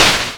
wiggle

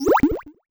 jelly, creatures

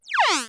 close; Oryx

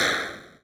hit, impacts

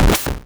curse; Oryx

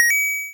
gold, interface

